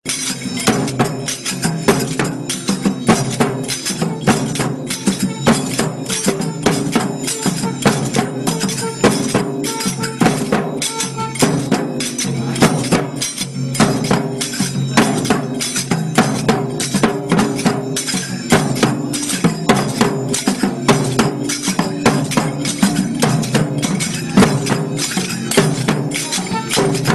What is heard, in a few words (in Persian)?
Tabl Senj Moharram; بی کلام